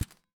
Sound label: sparks reel stop; netent